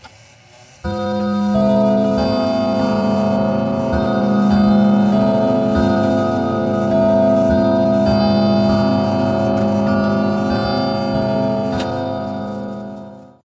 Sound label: clock chime; Five Nights at Ronald's